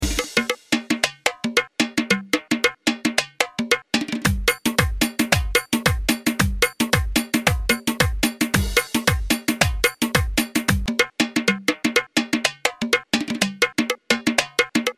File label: Bandari